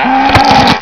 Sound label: bull whip; svencoop; hunger